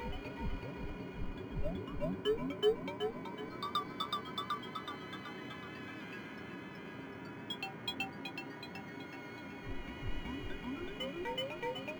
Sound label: BGM, Music; ゆめ2っき